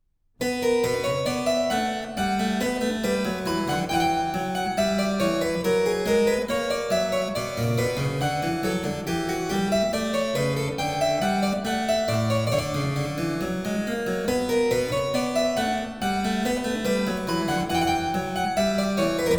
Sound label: French Suite, for keyboard No. 2 in C minor, Air; BWV 813 (BC L20); Bach; The French Suites